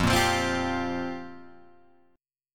F Suspended 2nd Suspended 4th F down Guitar Open G